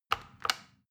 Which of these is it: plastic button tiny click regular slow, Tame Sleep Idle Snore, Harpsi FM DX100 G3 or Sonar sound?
plastic button tiny click regular slow